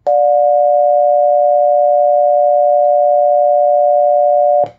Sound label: Tone